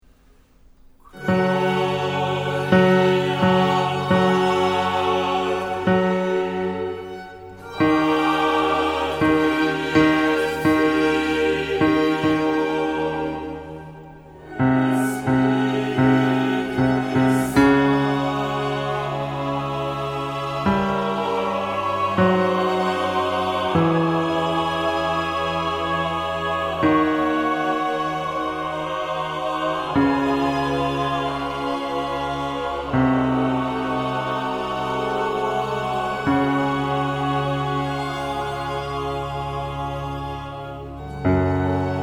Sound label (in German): Magnificat Nr. 8 Bass